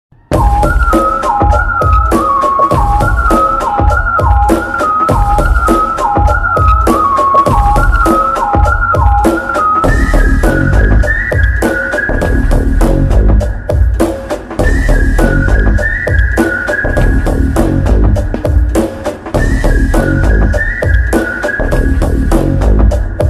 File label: intro whistle bgm mix; sarkaru vaari paata; mahesh babu; keerthy suresh